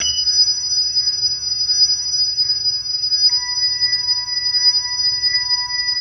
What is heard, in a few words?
Organ Lick; Ambient Grooves; Organs Licks